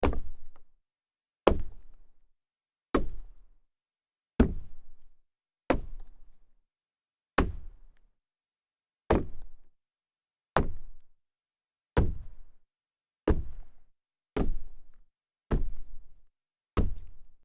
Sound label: sneaker small jumps solid wood, foley